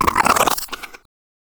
Pain Light; Evolve; Kraken; Grounder3

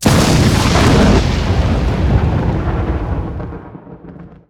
Lightning Strike Fire; Evolve; Kraken

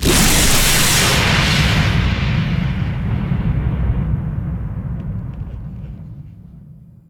Lightning Strike Impact; Evolve; Kraken

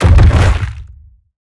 Roll Surface End; Evolve; Behemoth

